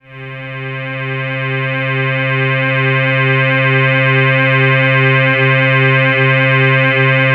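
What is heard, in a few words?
PAD C4 right; USB Soundscan vol.05 - Explosive Jungle; AKAI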